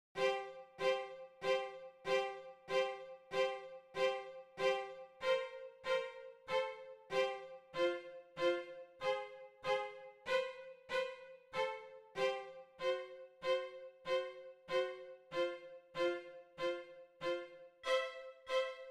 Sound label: strings